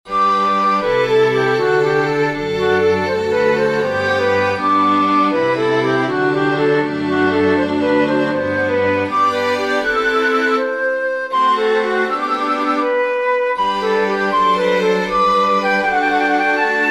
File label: sonata major for flute strings; raehs morten; sonata xii